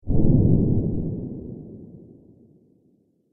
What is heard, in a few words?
Dinosaur Mighty Footstep